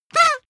paper hoot loud short

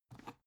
dvd case pick up soft

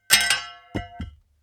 metal plate thin drop

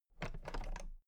toolbox latches open very soft

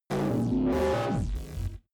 space computing machinery hard

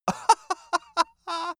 male laugh bright

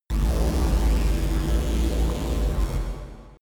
space working machine big

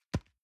envelope drop soft dry

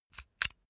puncher operate empty

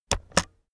keyboard external space press slow heavy

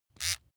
razor small short

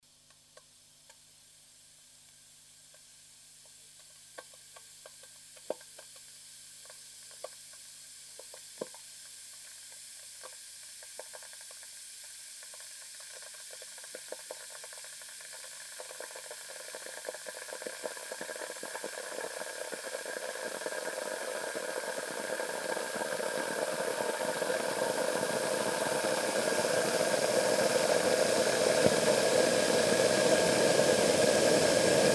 kitchen water boiler

